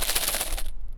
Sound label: Shaking Box of Screws; BB Retail Therapy Sample Pack